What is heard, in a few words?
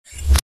whoosh fast, transition effects; transition sound effects